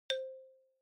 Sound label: Xylophone, Single